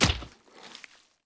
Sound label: Splat